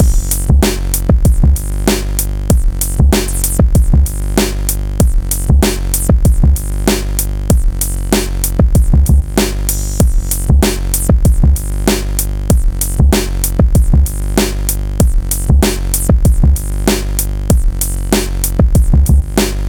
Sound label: buzzin loop